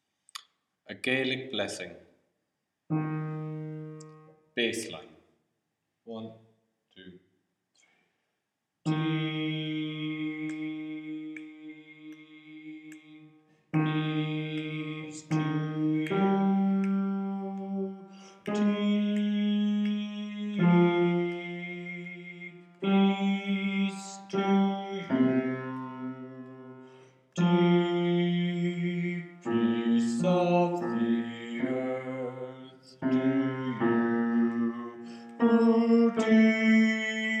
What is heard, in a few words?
Bass